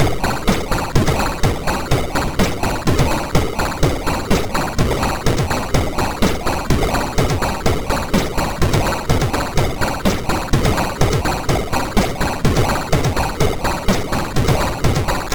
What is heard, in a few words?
tune, sid, MOS6581R4